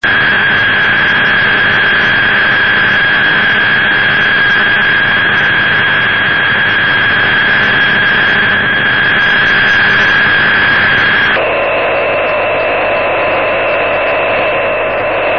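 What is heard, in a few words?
Explorer 52 Hawkeye SSB and AM, satellite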